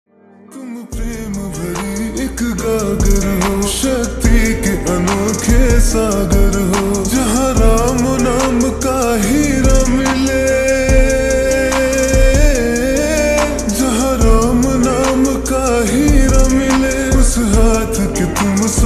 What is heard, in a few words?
Slowed Reverb Ringtone